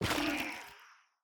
Sculk Sensor break

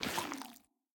Sculk step

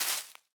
Leaf litter place; Ściółka

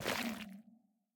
Sculk step

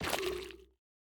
Sculk Sensor place

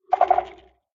Sculk Sensor sculk clicking

